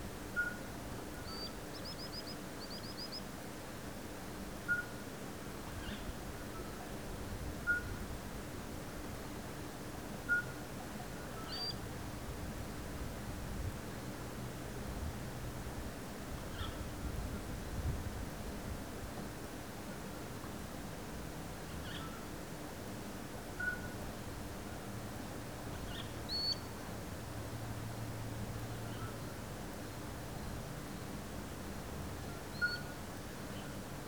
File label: Sandpiper; آبچلیک